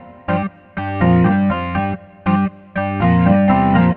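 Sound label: Rock Funk Organ, Keyboards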